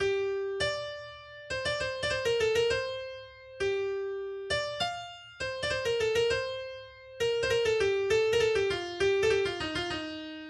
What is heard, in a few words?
Opening Music; Ak Memnu